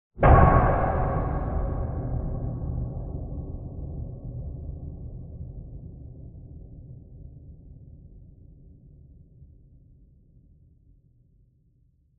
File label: impacts hit metallic loud clunk reverb